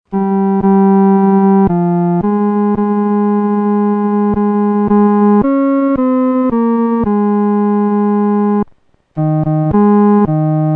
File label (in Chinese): 独奏 第三声